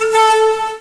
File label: Pan Flute; Urban Shakedown